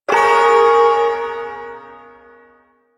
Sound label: Ersatz Shawzin Yo Chord; Warframe; Duviri Ersatz Shawzin